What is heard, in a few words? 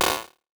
perc; goodtakimu drumkit; fl studio; drumkits